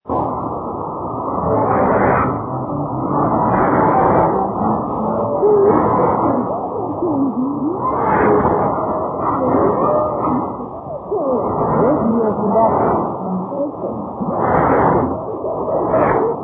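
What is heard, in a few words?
radio, 6045 kHz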